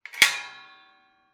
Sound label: Iron gate, latch, sliding, rotating, lock, clang; Eiravaein Works; Vaeyan IV